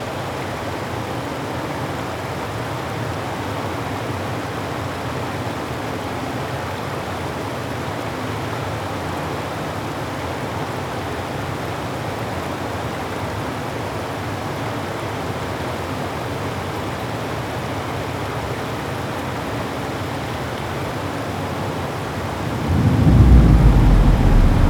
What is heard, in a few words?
rainstorm ocean ambience; Other Rainstorm Ocean Ambience Var